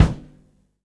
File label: BD, Drums Acoustic